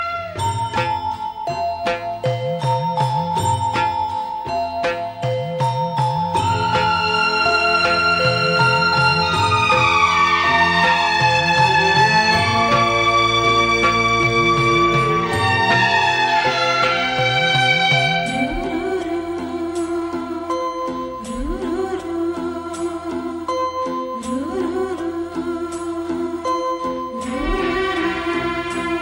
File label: touching melody, ringtone